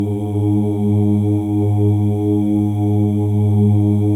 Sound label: MALE OOS, Symphony of Voices; Spectrasonics